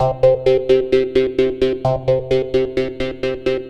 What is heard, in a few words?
MODUORG C, Modular Synth Loops; Polyend; Simulacrum Sounds